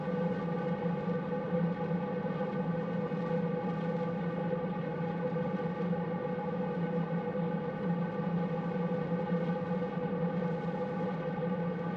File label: burst 16ms; termocoppia 3; CESI; LaSpezia